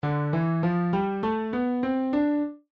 mode de ré

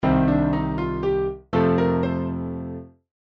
Mode dorien sur Dom Fa

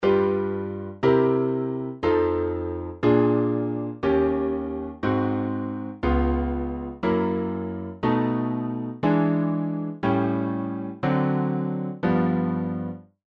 cycle des quartes en majeur

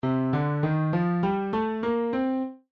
mode de ré base do

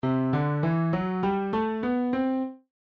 mode de fa base do

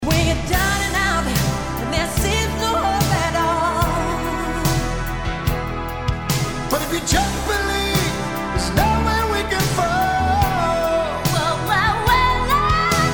cadence do re mi

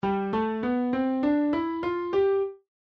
mode de sol

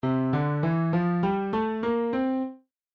mode de sol base do

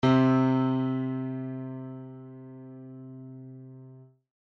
Do sans compression

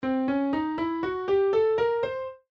Gamme octotonique

